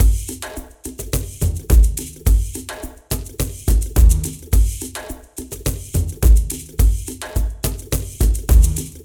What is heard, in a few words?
Night Attack; Beefense BeeMastered; Beefense Night Attack2